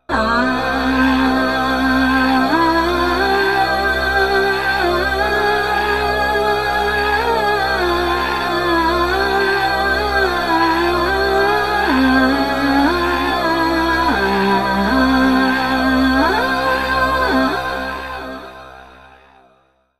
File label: V-Synth